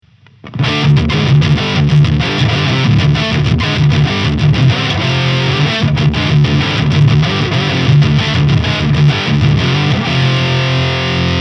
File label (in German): Boss HM-2w Boese